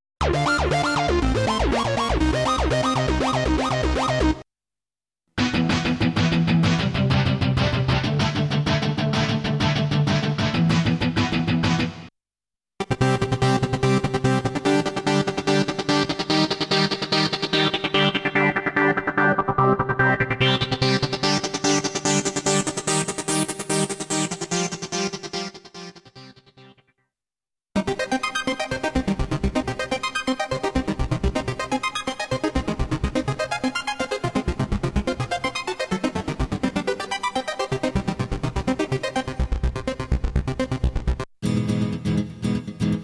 roland xp10

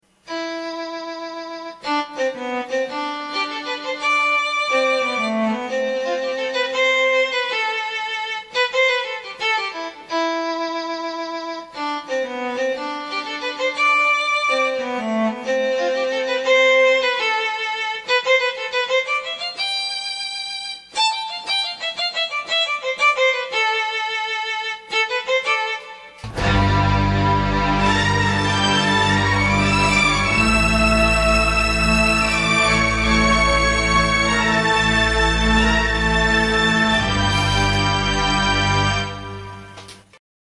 kurzweil 250 strings ensemble

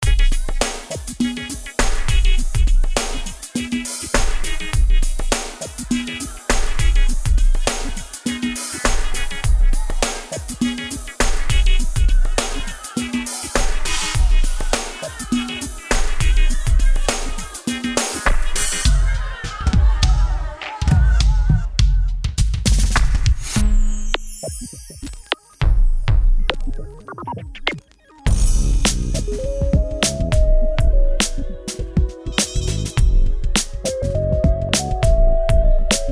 korg triton

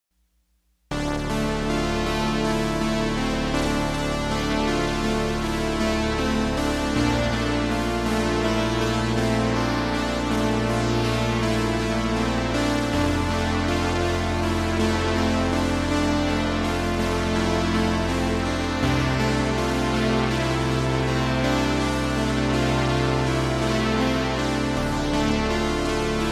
oberheim ob xa

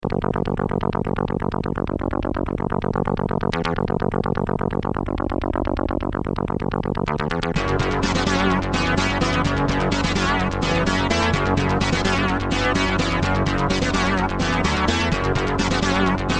casio cz 5000

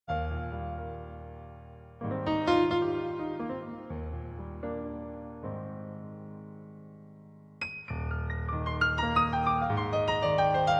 roland piano rd 100

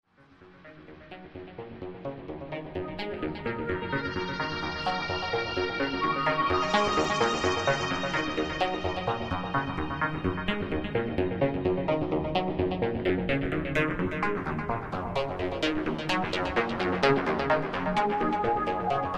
yamaha dx100

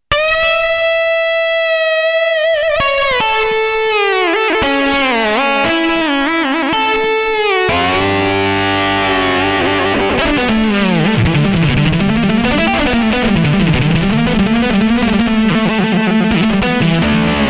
yamaha ex

